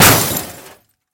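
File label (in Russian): мгновенно разбитое стекло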